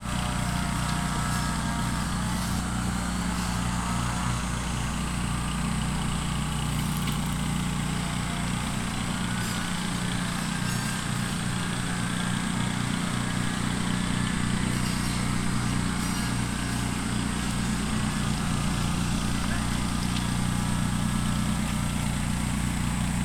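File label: mowing, Fukushima Soundscape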